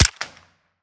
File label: fire, arccw sound; 22 sup; gmod; arccw uc commons attachments